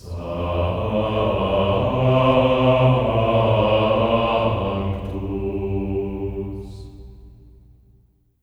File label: SANCTU F3; Spectrasonics; Symphony of Voices; GR SANCTU